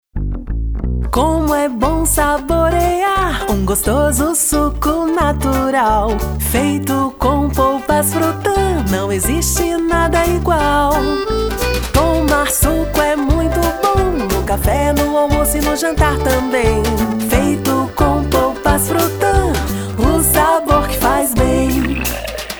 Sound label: JINGLE; FRUTÃ